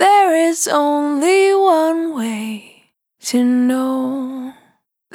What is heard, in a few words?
Dry, Single Voice Melodic Loops, Vocal Samples; Sony Creative Software; Pocket Diva World Class Vocal Samples